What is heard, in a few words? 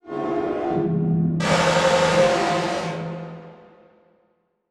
Title Transition Burst; Adobe; Production Element